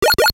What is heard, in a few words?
Videogame instant message; Nokia N79; Audio themes